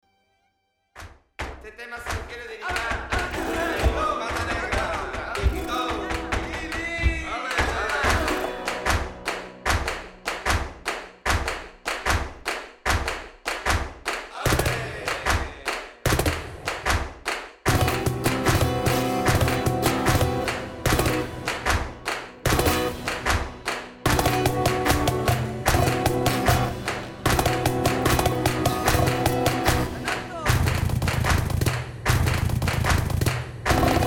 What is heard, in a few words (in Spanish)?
tanbory guitarra